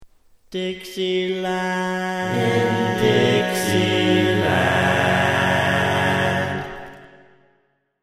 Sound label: Bass, barbershop tags